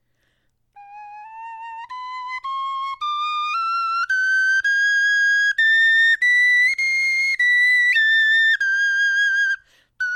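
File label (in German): tonleiter, Knochenflöten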